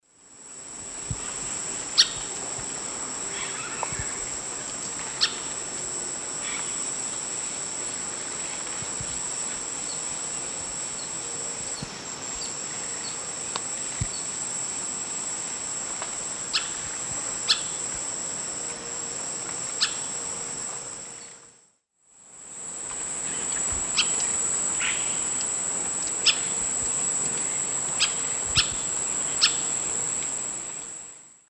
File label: Veniliornis passerinus, calls; S. America; Eastern Lowlands